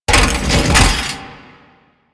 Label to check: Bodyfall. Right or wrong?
Right